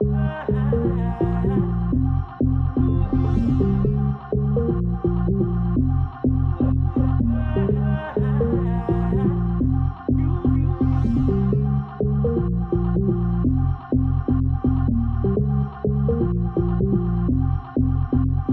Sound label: deep house organ melody mixed with vox, 130bpm, F minor